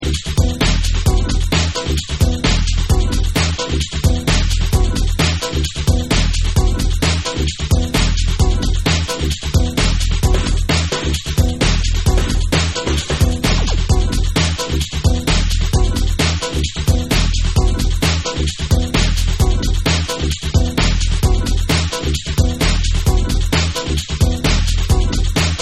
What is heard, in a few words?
Instrumental Remix